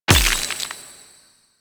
Mining Laser Gem Fill; Weapons; Warframe; Eidolon Mining Lasers

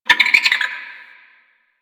Creature, Ambience; One Offs; Creatures; Warframe; Quests; The New War; Drifter Camp

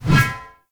Shield Whoosh; Weapons; Enemy; Shields; Sinecure Shield

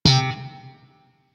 Shawzin Slap Oct Three C, Chords; Music; Warframe; Sentient Shawzin

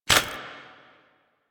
Footstep Default; Warframe; Hoplite

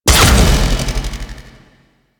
Short Bow Charged Fire; Warframe; Duviri; Dax; weapons

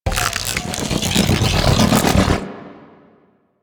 Ice Ring Charge Up; Enemies; Abilities; Warframe; Corpus; Arachnoid Camper Terra

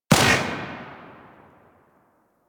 Rifle Shot Secondary B; Weapons; Warframe; Tenno; Bard Rifle

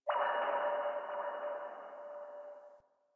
Landscape Distant Robot Call, Ambience; Warframe; Venus Open World; One Offs

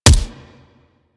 Weapon Punch Shotgun

